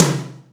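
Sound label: Rock tom, Boss DR660, ht; drum machine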